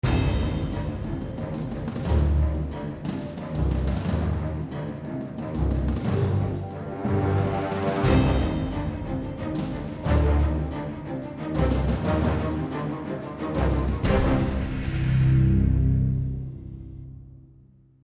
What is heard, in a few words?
Action